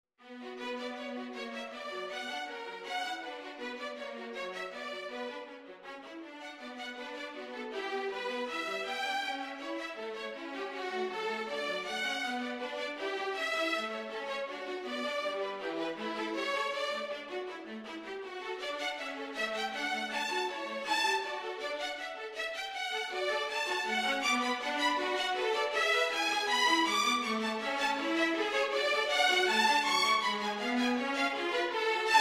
violin viola; duet; inventio

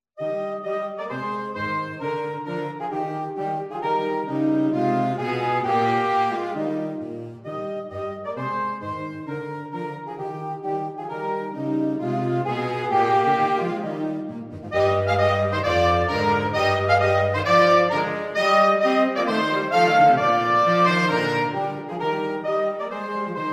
Flexible Saxophone Trio; Country Gardens

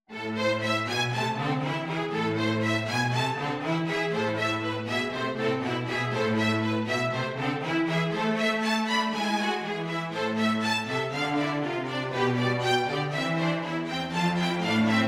string trio

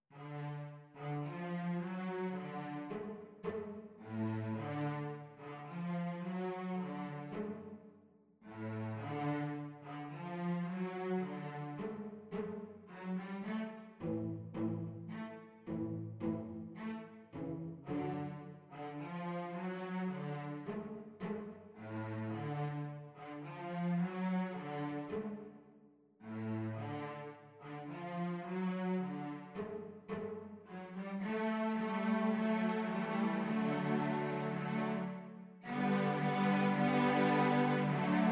Cellos Trio

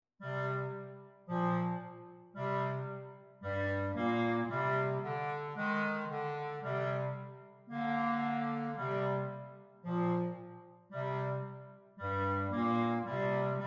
Clarinet, clarinet quartet

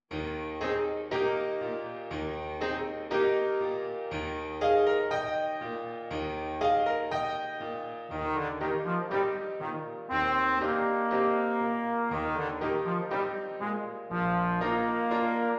Trombone; bass clef and bb treble clef

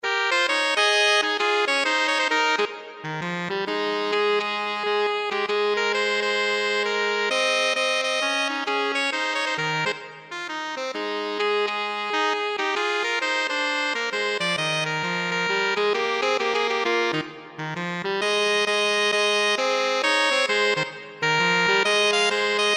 jazz Sax DUET, swing; hark the herald angels swing

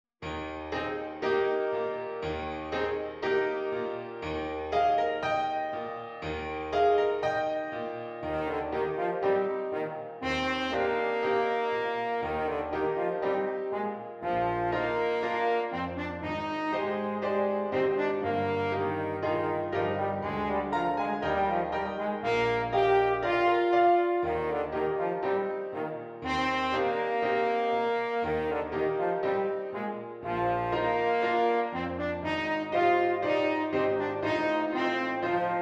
Beautiful Trinket horn, french horn

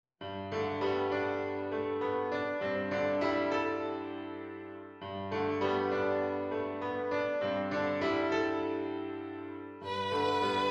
double violin; double violin series

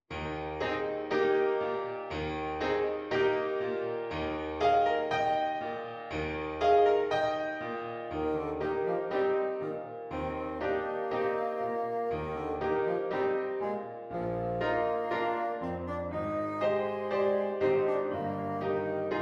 bassoon

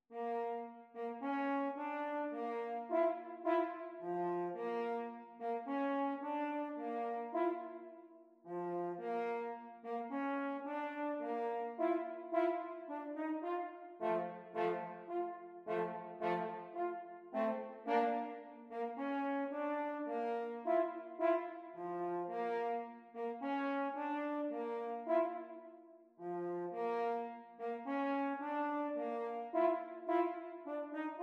Horns Trio

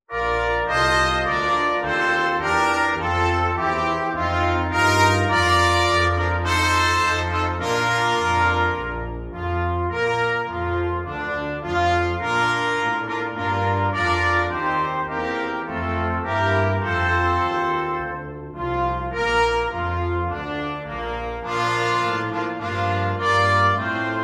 anthem Brass Quintet; flexible; advance australia fair

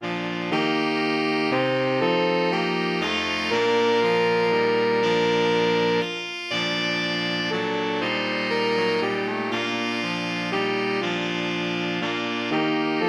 It Came Upon a Midnight Clear, saxophone quartet

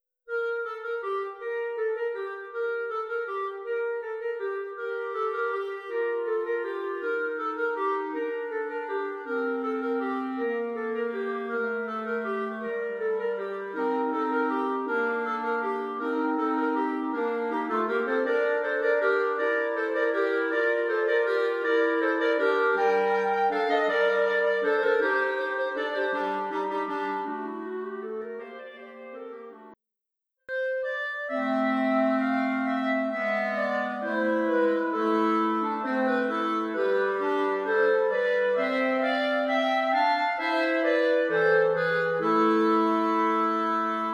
Clarinet TRIO

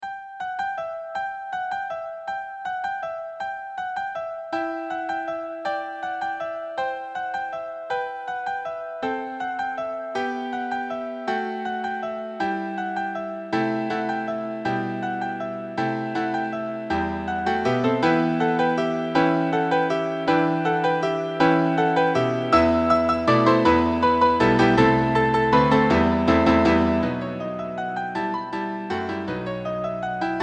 Piano duet, bell carol; Ukranian; fantasia on the ukrainian bell carol

